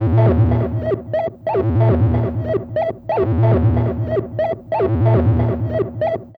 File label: Crude Tape Loop, Tape delay; radiosonics